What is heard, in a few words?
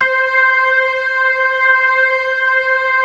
ORGAN, 4 DRAWBARS; Keith Emerson Signature Series